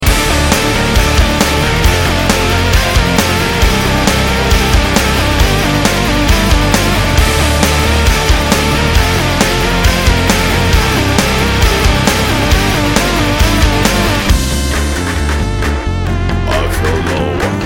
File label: live evil